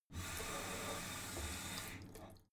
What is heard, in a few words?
tap flow light short, badezimmer